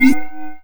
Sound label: item state in warp; Earth and Beyond; EA Games